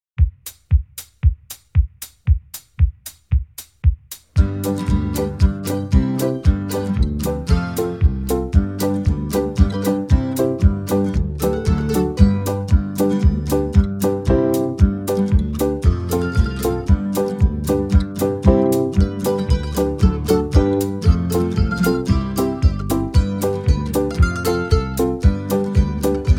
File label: key of g normal, banjo backing tracks; red haired boy